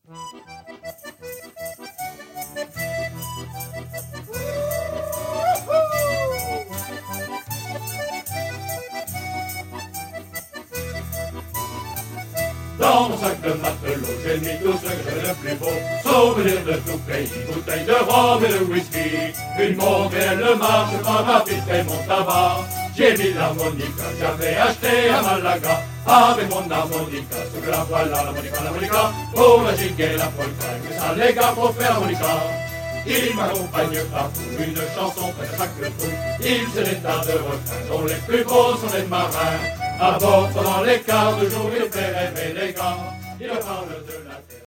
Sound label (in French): avec mon harmonica